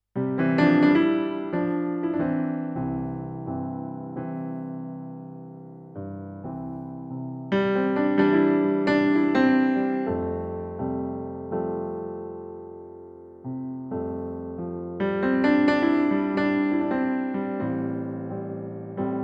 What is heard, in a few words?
Vivo SX-7 Pop Piano; Dexibell